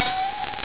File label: cat Step